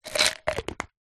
medicine vitamin c tablets plastic jar close lid

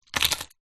vehicles keys car set down wood

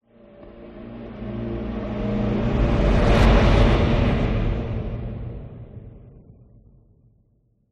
whoosh; orlando lanzini

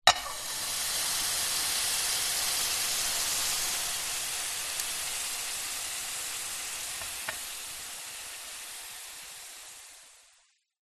household hot pan ice sizzle crack melt

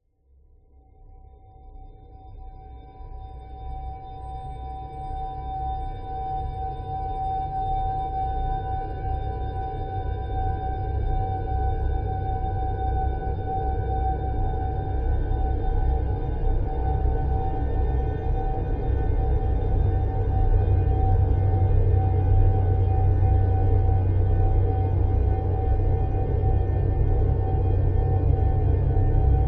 sound design drone dark sci fi or horror whine; zapsplat

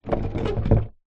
sport surfboard longboard movement on hard ground

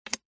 foley token plastic set down on table

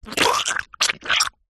horror guts squelch; zapsplat

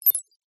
Beeps Tiny; lesser vibes

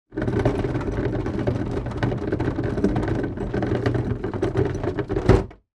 household bin small wheelie bin roll set down; sound effects one

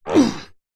human male fight vocalisation grunt; zapsplat

